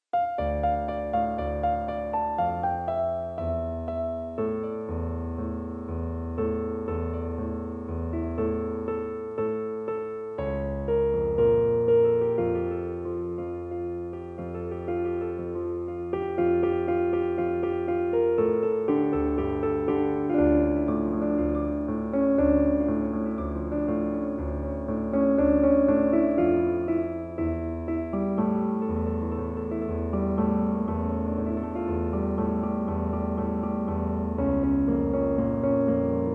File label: Piano